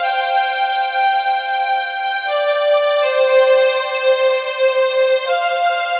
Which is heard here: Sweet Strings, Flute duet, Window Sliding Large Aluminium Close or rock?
Sweet Strings